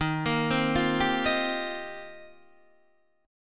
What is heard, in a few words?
D sharp 6th Add 9th, Guitar, DADGAD